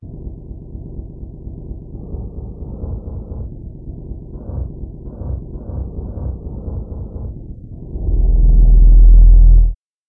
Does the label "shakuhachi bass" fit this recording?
Yes